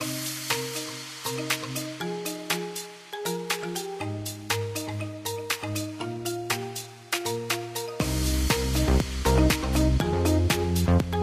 intro music

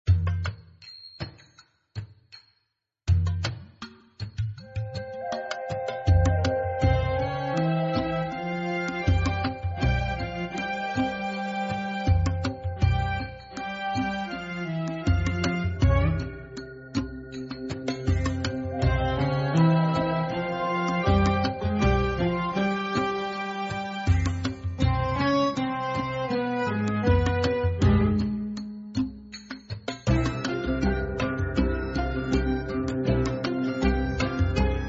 music Sheikh

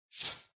window Close

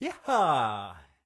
painting Eee

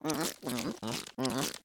Turtle Eating; surfer Island

